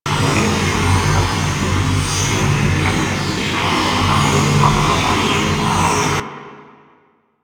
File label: shield loop; Warframe; Hub; Tenno